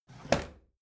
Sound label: bathroom drawer open heavy